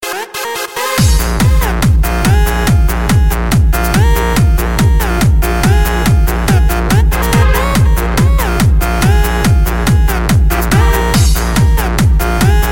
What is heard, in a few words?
Mix Club